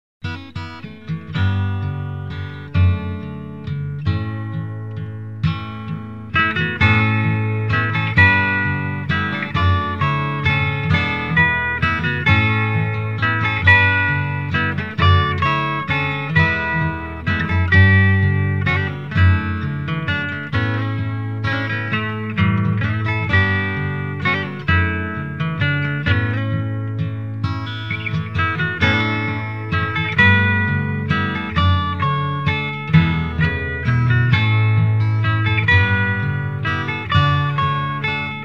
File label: Waltz